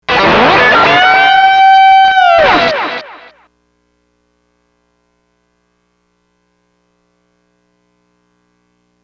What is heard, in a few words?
End Jingle; Zoids vs II Battle Legends